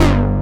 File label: WOW BASS; AKAI S6000 CD-ROM - Volume 1; SAMPLE WAVE MIXING 1